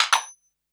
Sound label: Notifications and Buttons